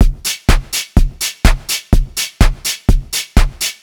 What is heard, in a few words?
Drums, 125bpm, Nu Dance Electro; Zero-G; Soundclash; Combi Loops; Sticky Bits